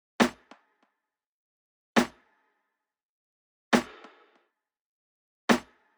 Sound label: Snare, 68bpm